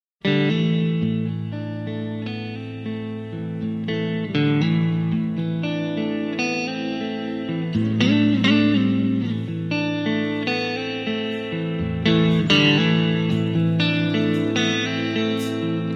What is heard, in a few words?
Blues